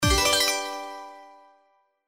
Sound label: Symbol Wins Wild, SLOTS; Majestic India